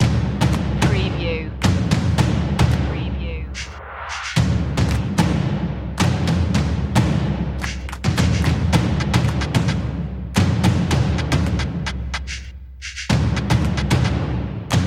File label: urban beat drums